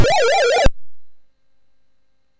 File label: sid MOS6581R4; Frogger II-Three Deep